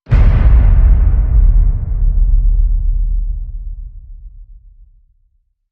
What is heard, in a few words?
deeep space hit trailer song